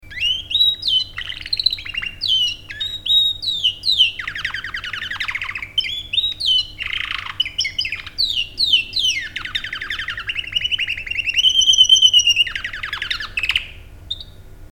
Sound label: ringtone